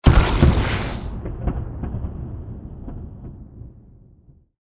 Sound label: explosion